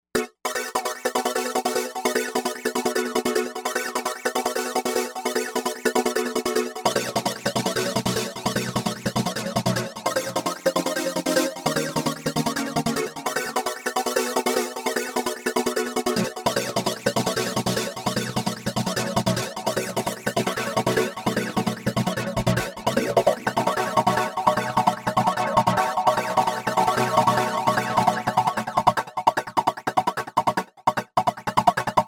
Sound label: Hit Chord, Novation UltraNova; Factory Patches